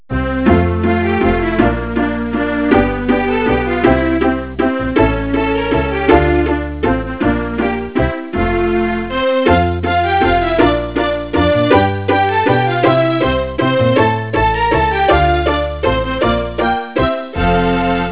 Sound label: wewish